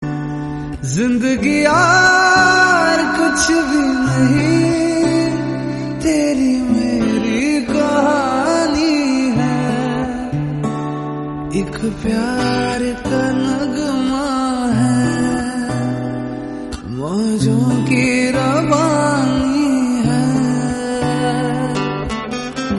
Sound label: hindi